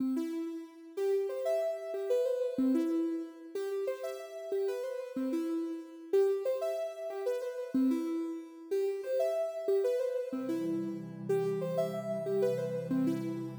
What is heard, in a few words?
simple electronic themed background Music; to help give some energy to my Bird Game